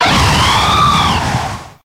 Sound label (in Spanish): Grito de Tornadus tótem